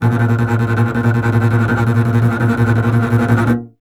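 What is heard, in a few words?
STR CBS, Solo Instruments, double bass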